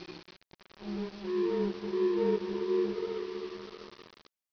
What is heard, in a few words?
DOLPHIN